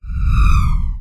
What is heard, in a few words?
whoosh passby left-right flange descend rumble whine; skyclad sound